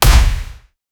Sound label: physical